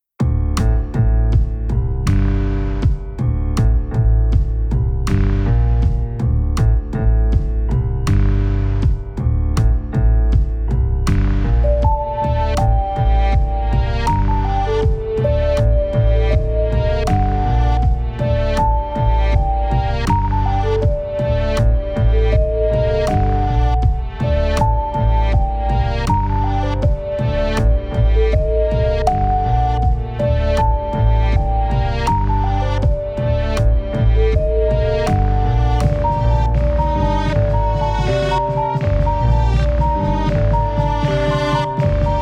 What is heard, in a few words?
PUNK BEAT